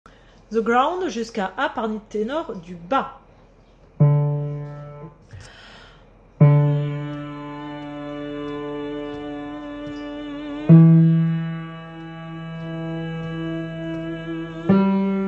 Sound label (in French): tenor du bas